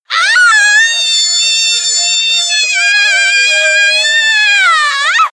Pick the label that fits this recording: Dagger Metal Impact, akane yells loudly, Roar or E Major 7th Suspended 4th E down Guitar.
akane yells loudly